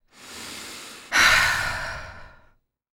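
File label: female breath; Spectrasonics; Vocal Planet; Jazz FX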